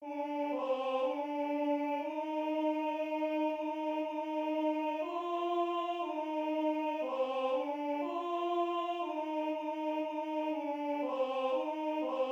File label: An Irish Blessing Alt